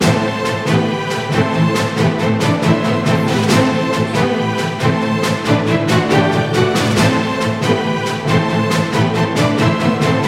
森林

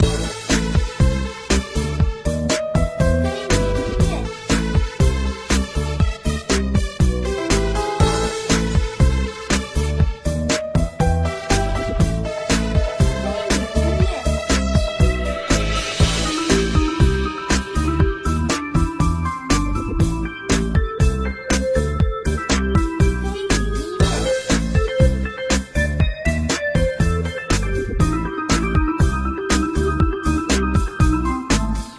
现代化都市 节奏感 激动

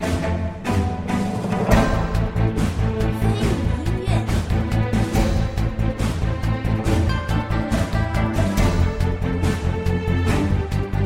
激烈 战斗 冒险

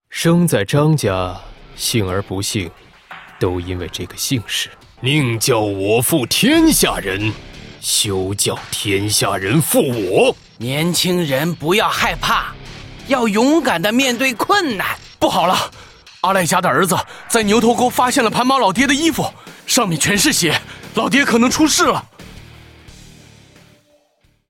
男 BGM

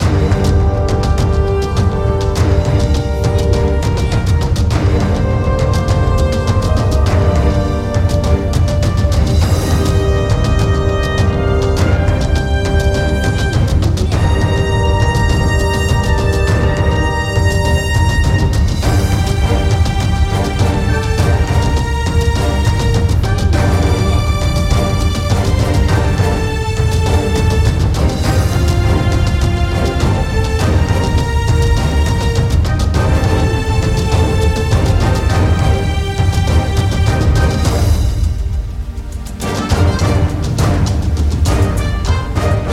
登录背景音乐

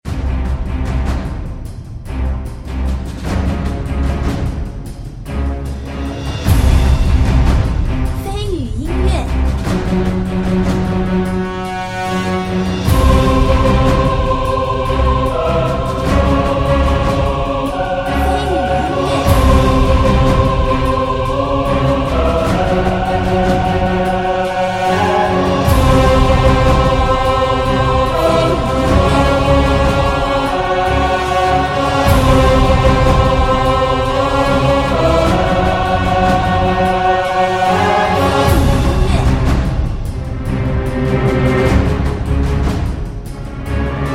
boss-紧张-危险

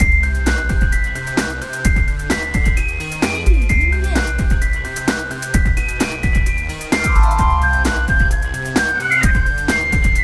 墓地

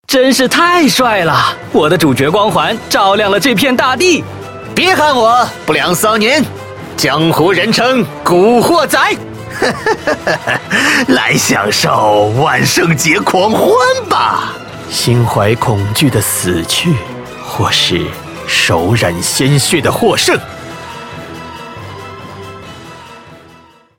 男 BGM

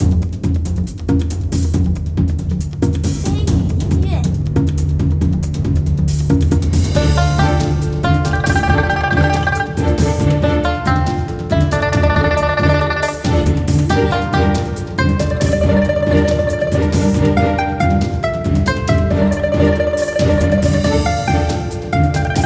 普通战斗音乐

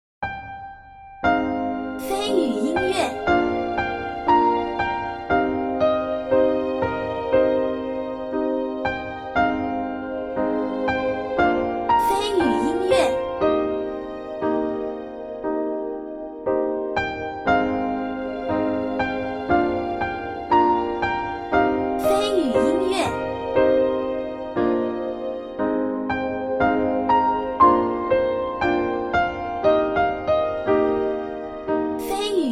舒缓 柔情 回忆; 轮回池

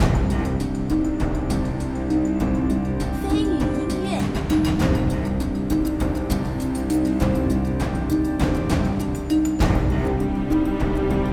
战斗 机械 梦幻

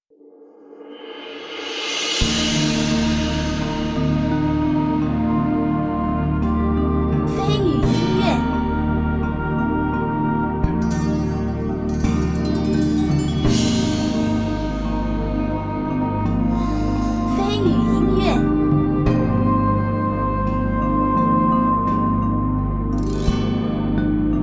淮河流域-空灵-宏大

